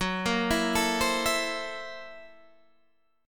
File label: F sharp 7th Sharp 9th Flat 5th F sharp, Guitar, DADGAD